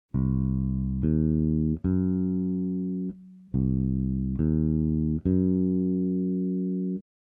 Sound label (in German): verminderter Dreiklang